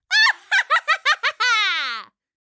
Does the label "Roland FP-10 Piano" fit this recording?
No